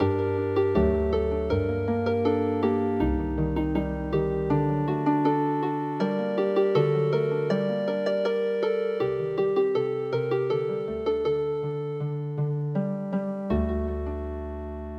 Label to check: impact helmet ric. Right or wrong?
Wrong